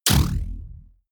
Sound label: sci-fi electric blast; schusswaffen